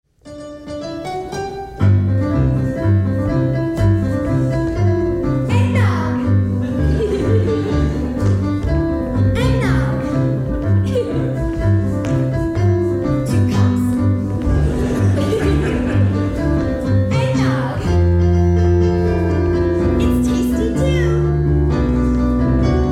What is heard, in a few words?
Polka